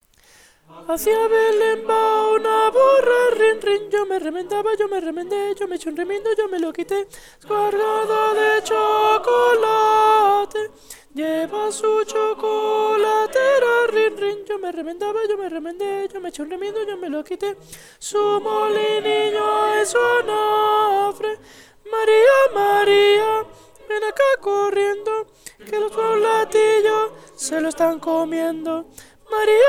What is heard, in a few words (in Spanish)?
Hacia Belen va una burra contraltos; navidad